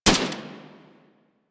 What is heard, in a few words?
Dual Soma Fire 2D B